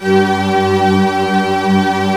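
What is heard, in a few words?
C4, full orchestra; East West; Global Sounds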